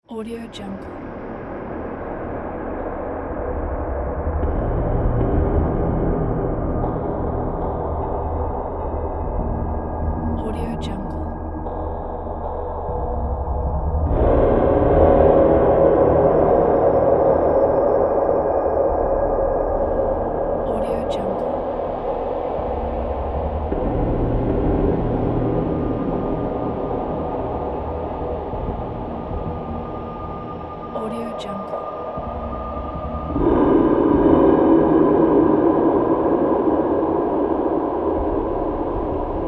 enormous terrible atmosphere

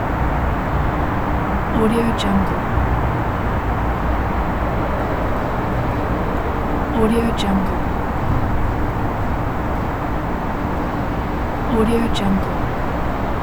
parking garage reverberant ambience

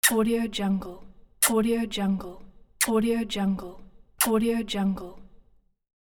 kisses

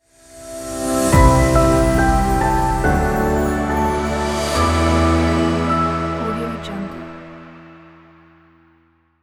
revelation logo

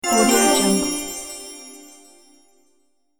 choir magic shine

